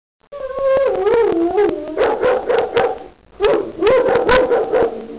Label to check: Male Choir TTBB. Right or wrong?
Wrong